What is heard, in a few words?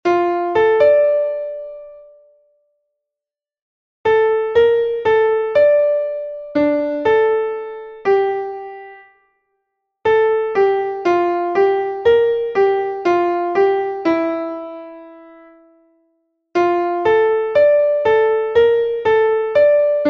piano